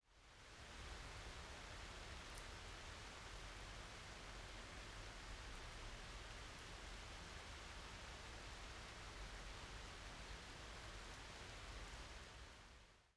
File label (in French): Pluie fine